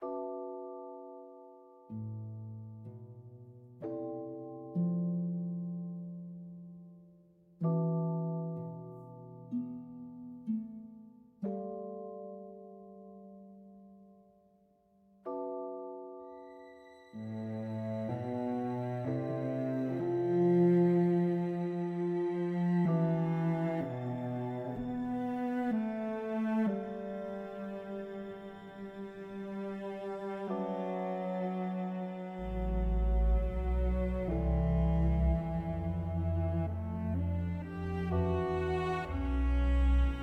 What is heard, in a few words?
calm, music; game; minecraft; TBMPack